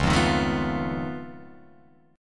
C sharp Augmented 9th C sharp down Guitar Open F, chord; C#+9; strummed; JGuitar